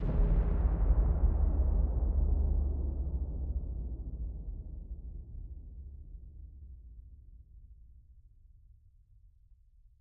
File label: end flash, ambient weather; minecraft 1.21.10